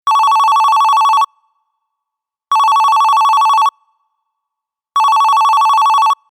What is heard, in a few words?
phone